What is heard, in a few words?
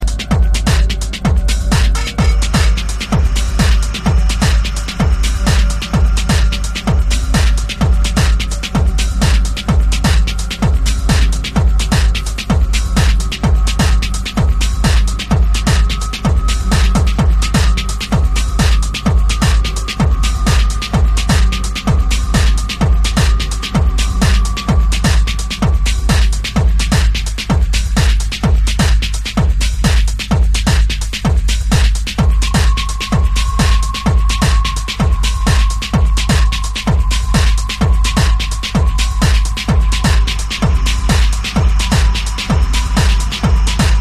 perc forge